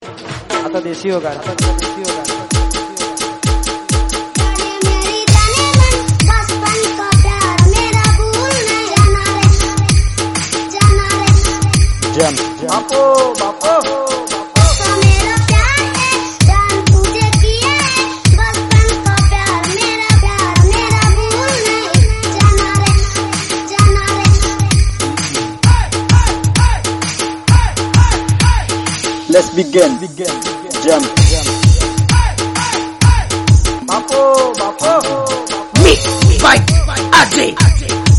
Kid Singing Viral Song Desi Dhol Remix, Gujarati Dj Remix Song